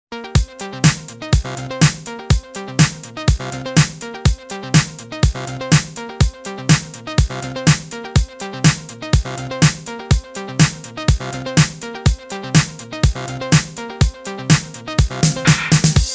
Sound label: remix